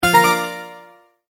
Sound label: multimedia tone start